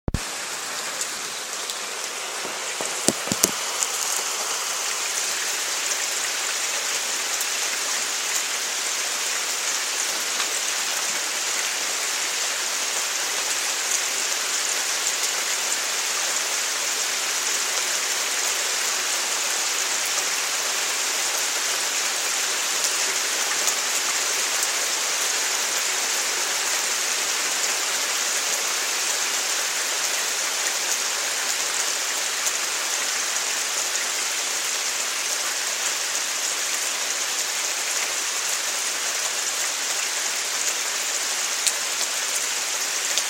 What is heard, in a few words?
Night Rain; USA 2014